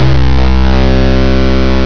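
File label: Fuzz Bass; Instrmnt; Sound Library 2000; Wayzata Technology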